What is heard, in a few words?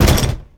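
strongly roughly closed wooden door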